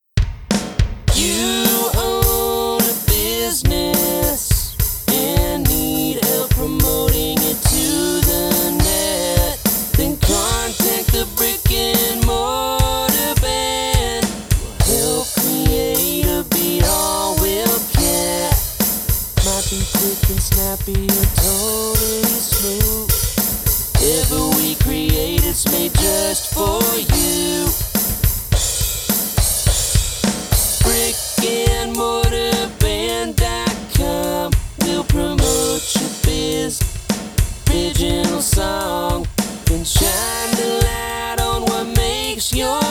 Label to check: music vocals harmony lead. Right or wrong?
Right